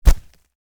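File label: newspaper drop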